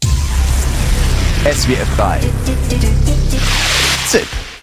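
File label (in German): zipp, Radiojingles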